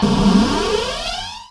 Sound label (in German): RAUMSC; Best Service; Advanced Mega Effects